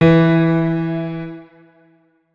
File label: notes, keyboard piano; Hideout Furniture